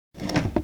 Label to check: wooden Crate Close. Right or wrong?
Right